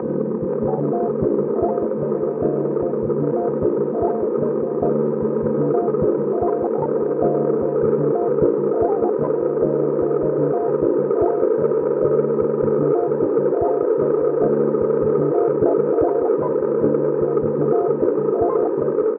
Drone Dm11 100; Ghosthack; Cypher

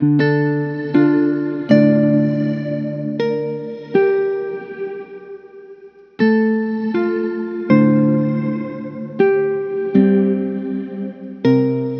Musical Loop 80 D Minor; Memories; Ghosthack

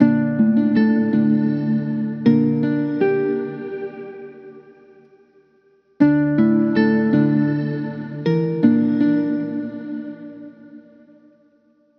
Musical Loop, 80, D Minor; Consciousness; Ghosthack

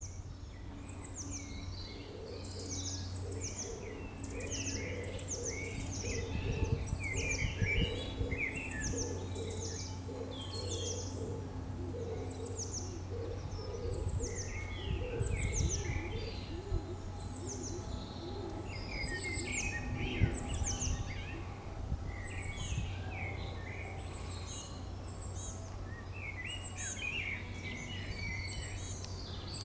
birds sound; vanagart

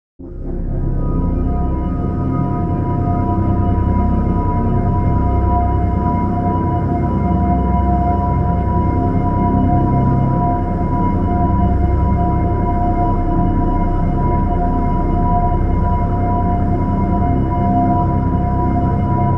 Drone Systems C; Ghosthack

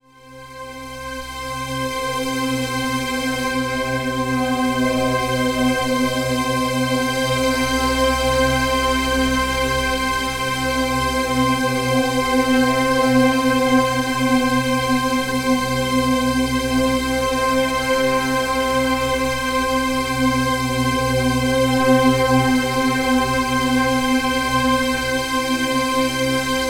Atmosphere, C; Ghosthack; Perceive

